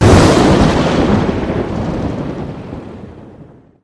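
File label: thunder lightning strike; ambience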